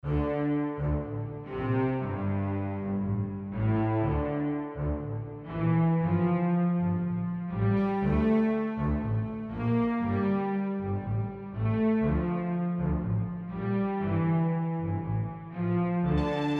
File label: Film Noir Suspense